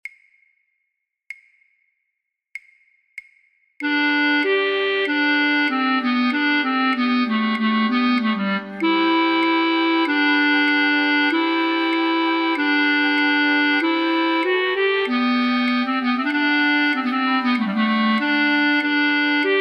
Musette flute choir altfluit; voor-oefentracks; Rameau; Platee

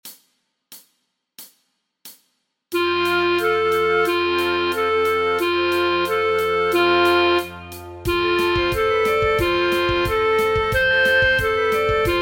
voor oefentracks fluit

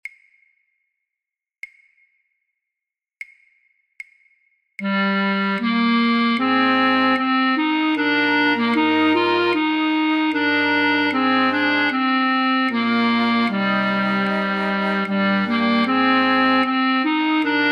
Holst Jupiter Andante oefentrack basfluit; Andante maestoso

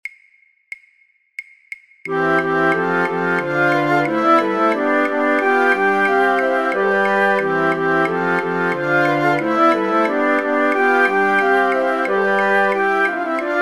Branle flute choir alle partijen samen; voor-oefentrack; Rameau; Platee

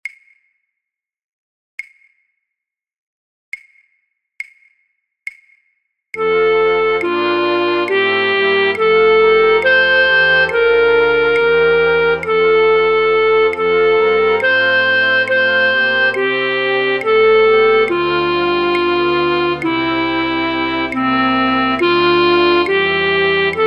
fluitorkest, Fluit; voor oefentracks; Matthaus Passion

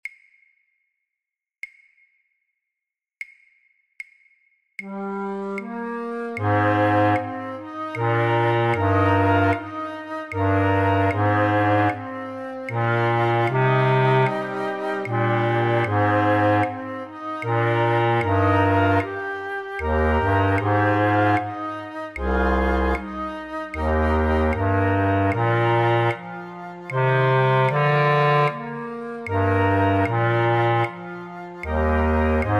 Holst Jupiter Andante oefentrack contrabasfluit; Andante maestoso

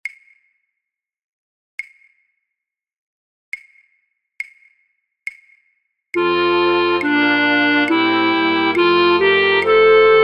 fluitorkest, Fluit 3A1, Fluit 3A2; voor oefentracks; Matthaus Passion

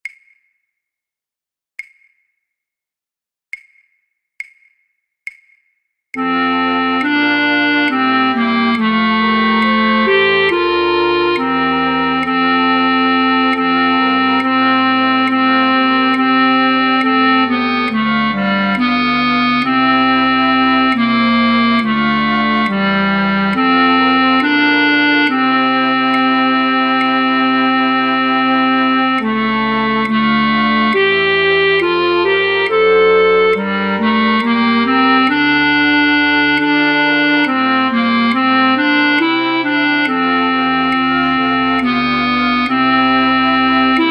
Matthaus Passion fluitorkest Altfluit; voor oefentracks

